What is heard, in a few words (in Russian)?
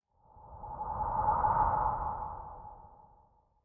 mejplanetnyiy potok tihiy gul, zvuki zvezd